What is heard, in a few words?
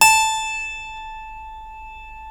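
A4 clavinet left, Keyboards Acoustic; USB Soundscan vol.17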